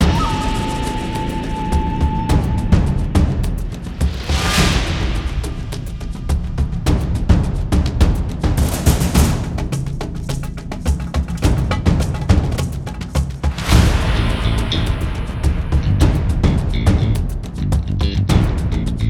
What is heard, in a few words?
Low Combo; Aztec Jungle